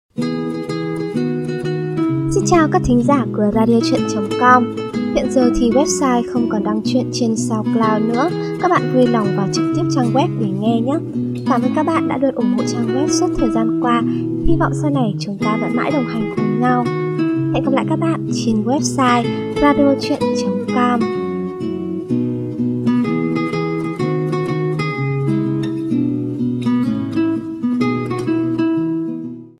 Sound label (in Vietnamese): tieng cuoi trong dem khuya vang; radio kinh di